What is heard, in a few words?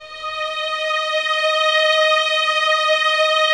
Violins Soft D#5, String Ensembles; Miroslav Vitous; 11 Violins